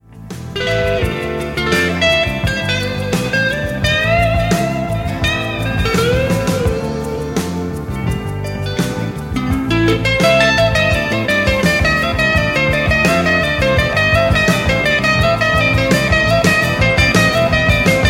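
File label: Schecter Strat, guitars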